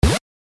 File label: door